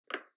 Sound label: footstep wood